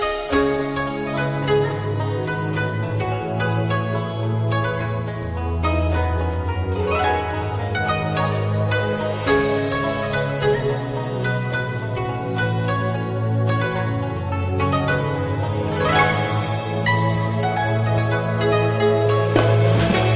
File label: Japanese Melody